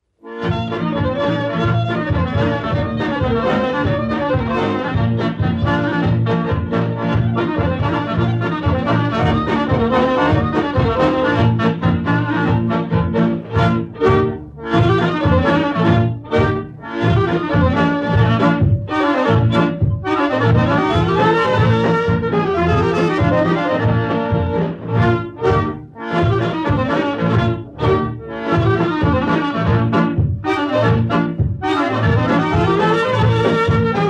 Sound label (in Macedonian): Macedonsko Narodno Oro 9 8; Sperry; Red Label 10 inch